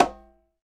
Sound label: Conga Lo; Roland BK-5; Percussion